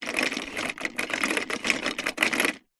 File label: toy plastic cogs turning